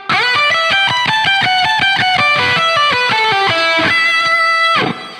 ギターフレーズ; プロっぽく聴こえる

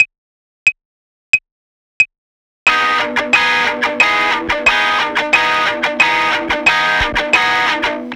表拍

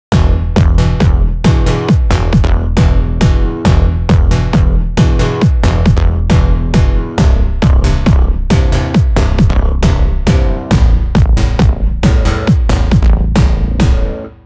ベースの打ち込み

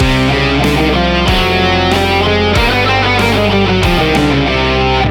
クロマチック

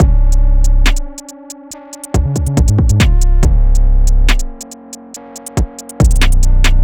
ダークメロディ

